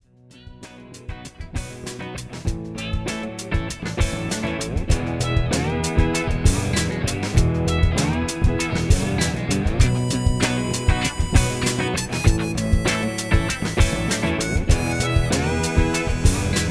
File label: slide